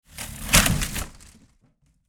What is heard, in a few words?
urban debris plastic little object passby